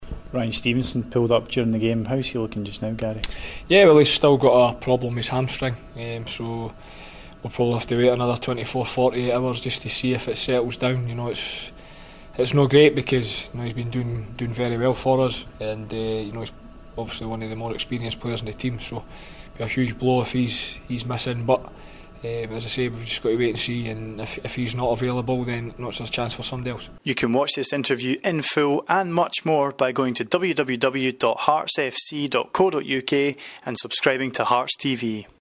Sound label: injury groans